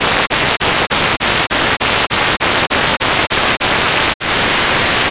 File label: am noise; Pitch; Melodia